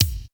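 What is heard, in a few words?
bass drum hi-hat, TR-808; East West; Global Sounds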